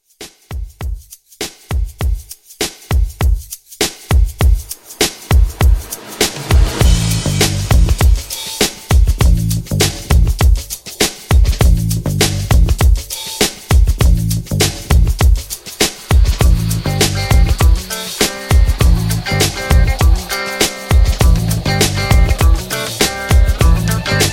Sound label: 70s Rock Re Drum 100